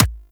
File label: Weapon Punch Pistol; Weapons; Pistols; Warframe